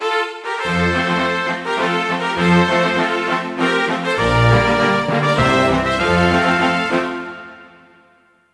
Cyprus, Fanfare; 1997 MMX LAN PACK (win 95); Worms Armageddon

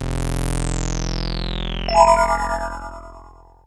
Mystery, Fanfare; 1997 MMX LAN PACK (win 95); Worms Armageddon

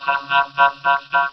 laugh; Worms Armageddon; Cyberworms

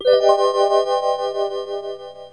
Magical; Fanfare; 1997 MMX LAN PACK (win 95); Worms Armageddon

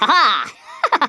LAUGH, Stiff Upper Lip

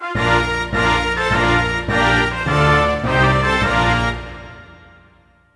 Tunisia, Fanfare; 1997 MMX LAN PACK (win 95); Worms Armageddon

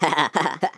laugh, Irish; 1997 MMX LAN PACK (win 95); Worms Armageddon

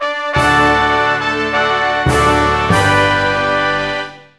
Denmark, Fanfare; 1997 MMX LAN PACK (win 95); Worms Armageddon

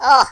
ooff; Worms Armageddon; Finnish

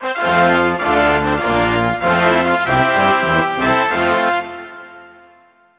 Fanfare; Worms Armageddon; Egypt

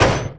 Letter Bang

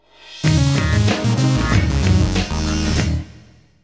Buzgroove, Fanfare; 1997 MMX LAN PACK (win 95); Worms Armageddon

